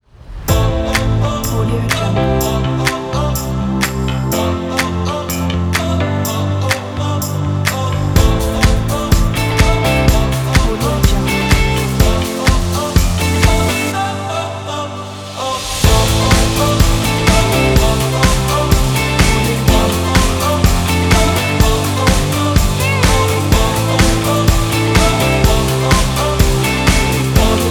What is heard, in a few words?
indie light pop rock